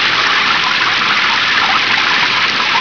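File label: rain, ambience urban; goldsrc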